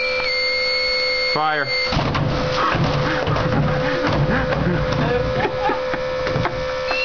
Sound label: garbage can